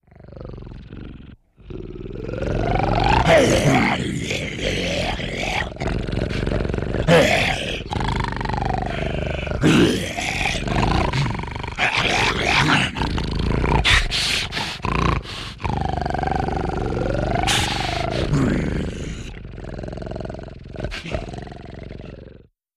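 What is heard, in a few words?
Devil Dogs Snarling, monsters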